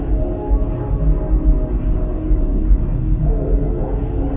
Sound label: reverb; ゆめ2っき